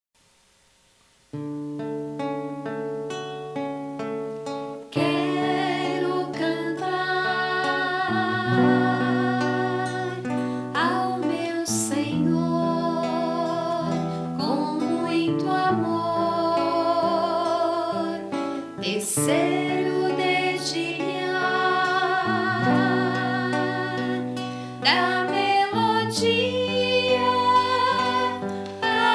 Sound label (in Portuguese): cantar